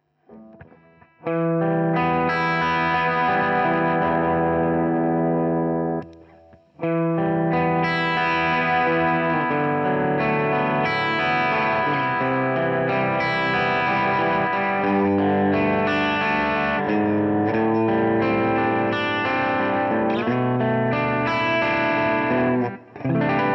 JHS Prestige Princeton Breakup Boost

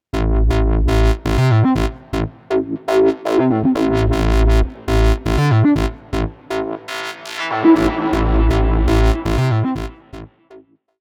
UNO Synth Pro X Presets

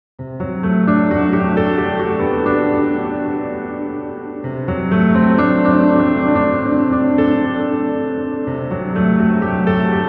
RaumMaschine Piano Big Warehouse; Tegeler Audio

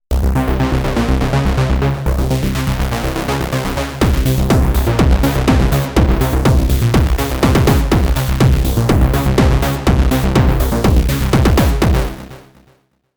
bass patch

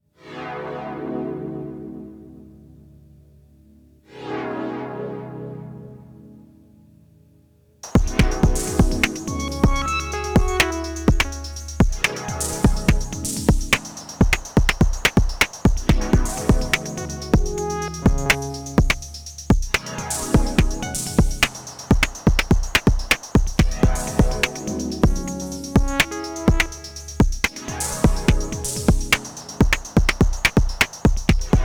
IDM; Workshop Soma Pulsar 23 Patch

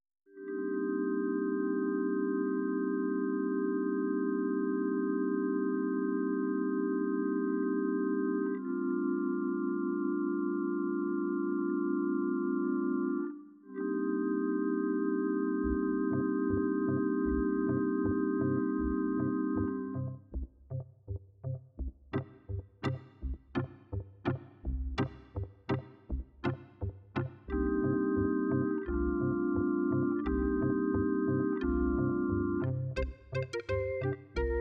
EXPRESSIVE E OSMOSE woodorgan

